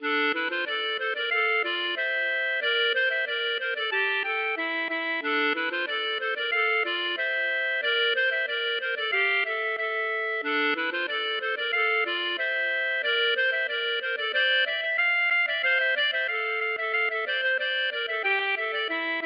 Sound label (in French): scottish auvergnate